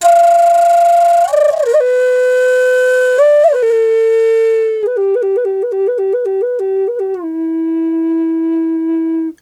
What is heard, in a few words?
E wild flute